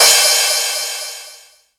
large room cymbal crash sound g key, Royality free crash cymbal drum sample tuned to the G note